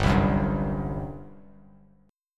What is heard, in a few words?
G Major 7th Suspended 4th, G, down, Bass Standard; GM7sus4 strummed